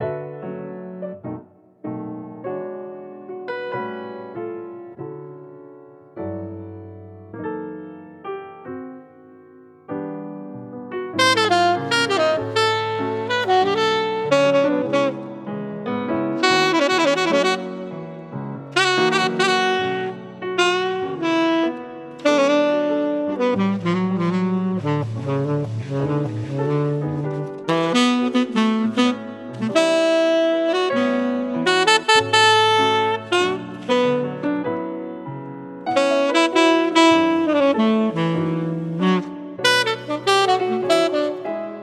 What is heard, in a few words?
Italian Restaurant Jazz with Tenor Sax